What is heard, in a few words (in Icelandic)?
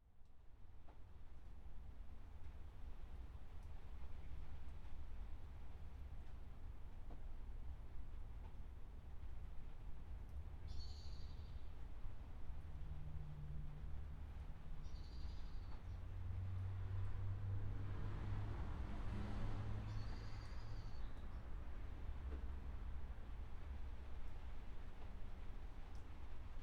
jardskalftar